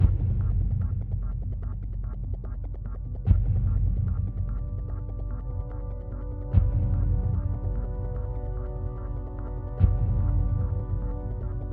Drone Tut Loop Main Stem with Drone Stem; Sniper Ghost Warrior 3 2017

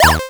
retro damage hurt ouch

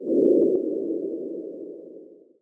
inspect; Lucius III; pc linux

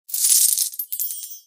Get Gift

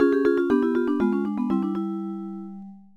Chime; little mermaid the ariel s undersea adventure nintendo ds

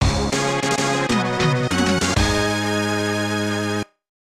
Win Competition

